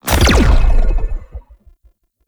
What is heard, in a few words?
Alt Shot Fire, Weapons; Warframe; Cedo Prime